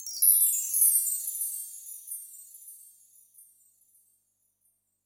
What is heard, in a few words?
CHIMES, PERC